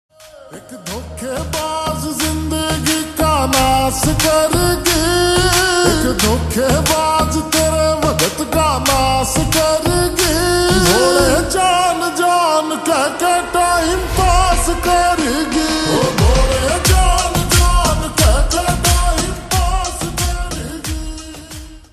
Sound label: Slowed Reverb Ringtone